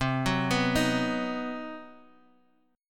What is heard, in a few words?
C Minor Major 7th Flat 5th C down Guitar Half Step Down